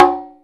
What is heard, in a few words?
CONGA SLAP; E-MU Formula 4000 Series Vol. 5; Protozoa; Phatt Instrmt